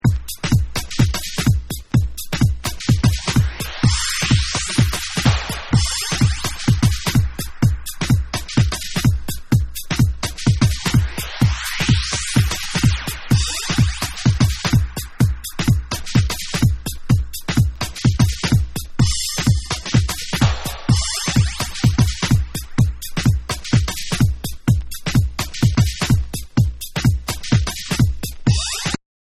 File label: Distant Drums